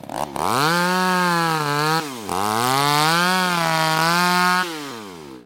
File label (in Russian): ceplnaya pila blizkii shumnii dlinnii